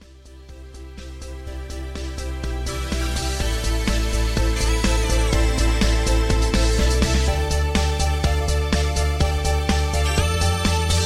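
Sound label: Instrumentals backing vocals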